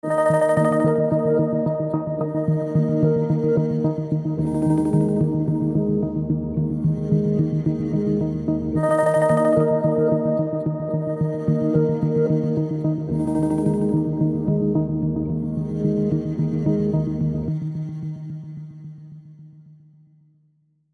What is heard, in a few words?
Light Pulse